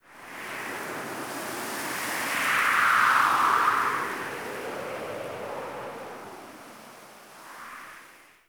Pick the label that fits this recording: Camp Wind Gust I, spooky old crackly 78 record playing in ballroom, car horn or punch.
Camp Wind Gust I